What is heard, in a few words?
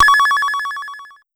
Pollard Syndrum